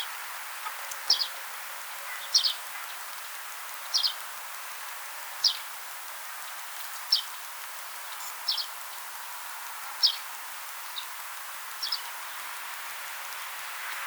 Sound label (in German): Ruf Feldsperling Passer montanus